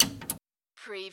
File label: Servo Panel Sound - Switch TV